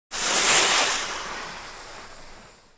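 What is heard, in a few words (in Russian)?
zvuki snouborda, snowboard sounds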